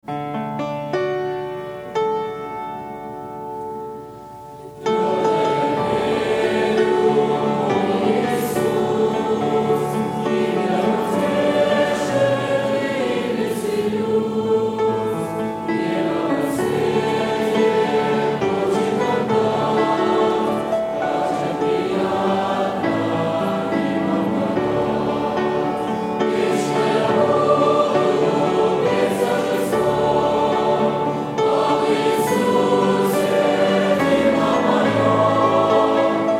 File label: song church